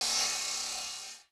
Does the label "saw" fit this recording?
Yes